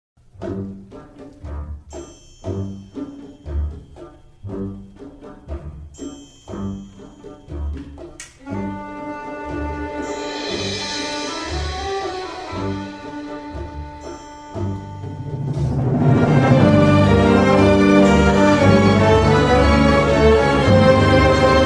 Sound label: (Orch)